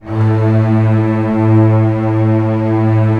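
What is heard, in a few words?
strings A1 FF; Sound On Sound - Peter Siedleczek's Orchestra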